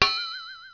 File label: BOING; VALUPACK